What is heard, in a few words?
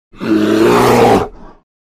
Bear, Grizzly Roar, Single, With Breath. Close Perspective.; bears